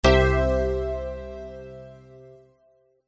multimedia game sound bold warm 70s retro synth positive end complete